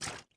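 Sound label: water run right, footsteps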